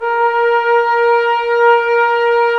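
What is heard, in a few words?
brass trombones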